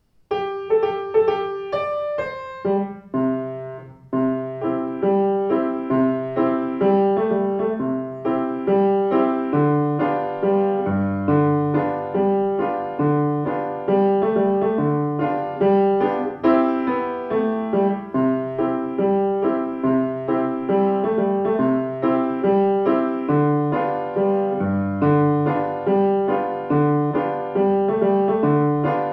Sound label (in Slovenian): flavtica klavir